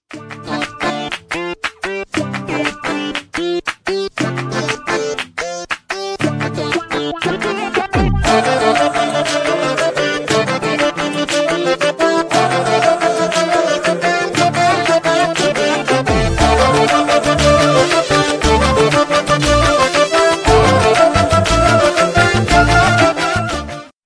instrumental ringtone; phantom movie

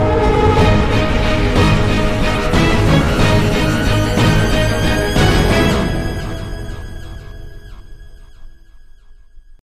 intro ringtone; arrow

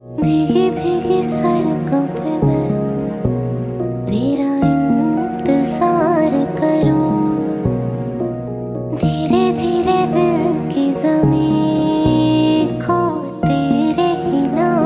lounge mix ringtone

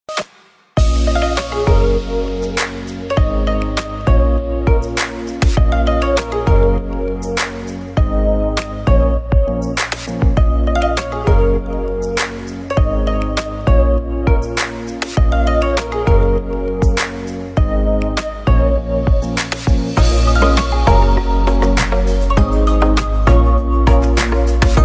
instrumental ringtone

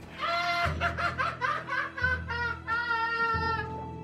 laugh ringtone

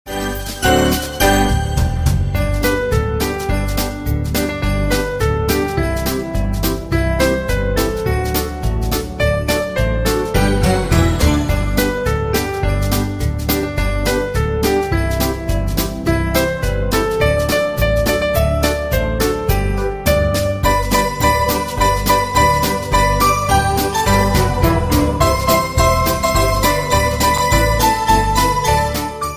best christmas ringtone

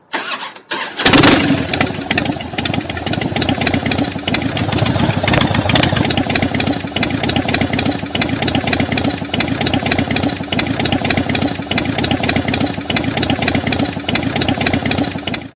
harley davidson ringtone

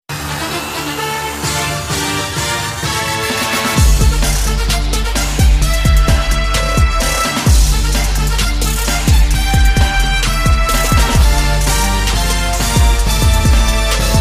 trap remix ringtone

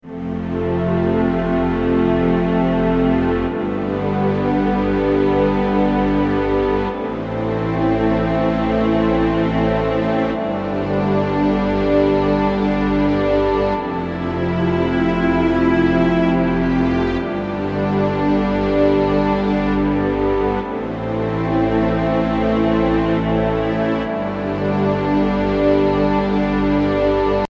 soft classical alarm ringtone

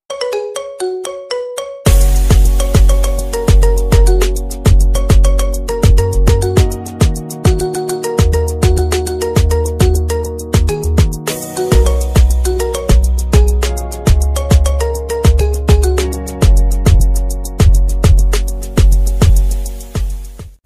marimba remix ringtone

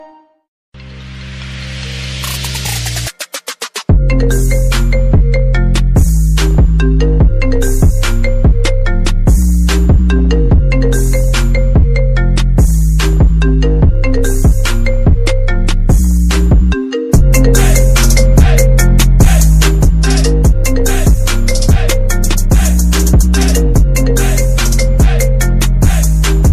iphone remix ringtone

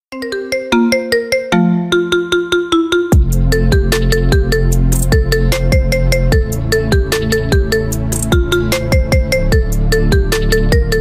marimba remix ringtone

